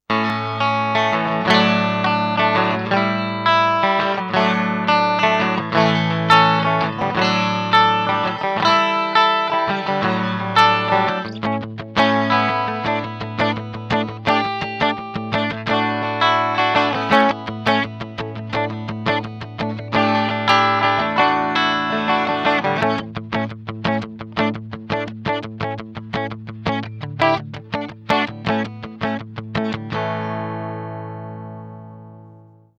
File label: Maxon FL9 Classic Flanging Normal